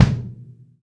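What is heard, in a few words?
AMB BD, ambient bass drum; mpc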